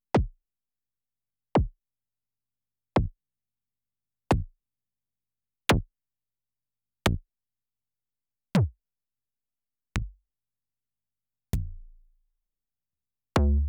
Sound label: Fire Instruments